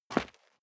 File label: step sneaker concrete grit single stair down; schritte